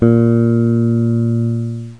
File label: A#, bass; Virtual Graceland